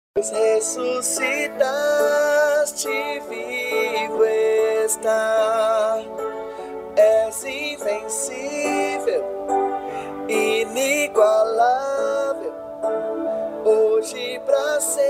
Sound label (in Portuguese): Oh quao lindo tenor